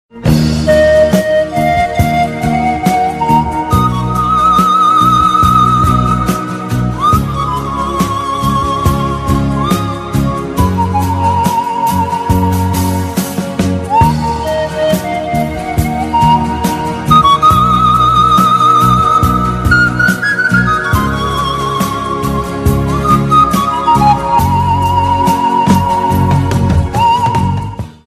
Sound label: pan flute bamboo ringtone